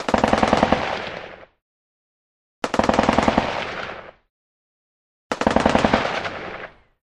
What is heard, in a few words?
M60 Bursts Short Dual